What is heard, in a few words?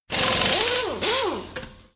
crucible, thermal expansion sounds blocks machine